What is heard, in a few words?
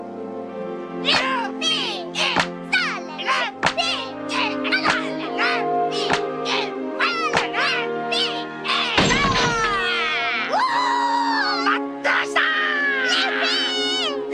Cheer; Luffy